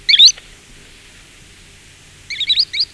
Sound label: Tringa ochropus; pticy; kulik